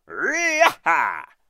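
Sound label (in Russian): krik kovboya, cowboy shout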